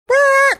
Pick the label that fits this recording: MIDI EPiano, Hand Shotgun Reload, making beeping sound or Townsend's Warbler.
making beeping sound